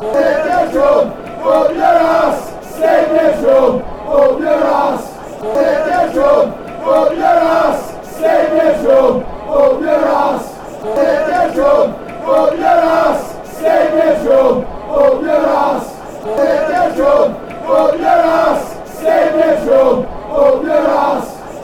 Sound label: stick yer drum, chants